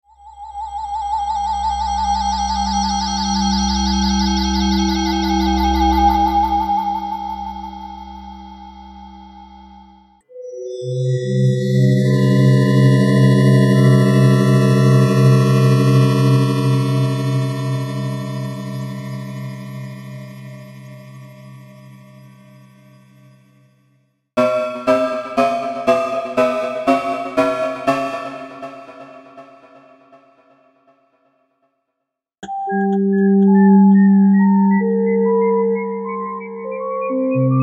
preset sounds

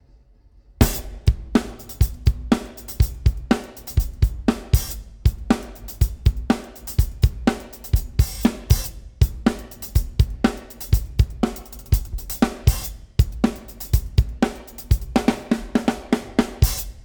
NLS Drive; Freqport

